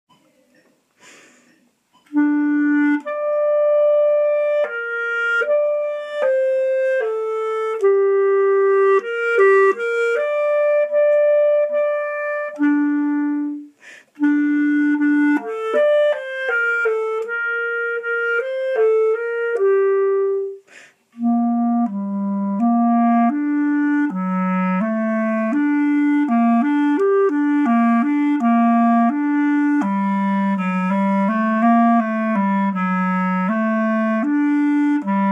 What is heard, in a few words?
clarinet